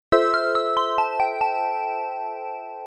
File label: Flavor, notifications; Oppo; Find X903